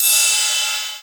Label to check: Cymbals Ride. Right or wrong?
Right